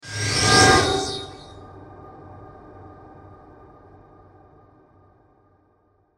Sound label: Whoosh Distortion Metallic Musical Sustain Reverb; Blastwave; Whoosh Sound Effects